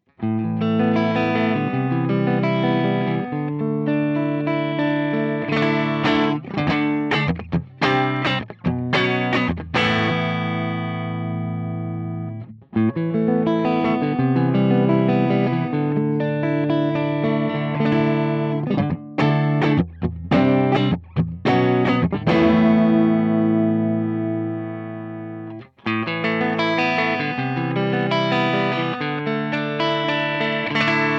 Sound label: Tele Clean Mic Position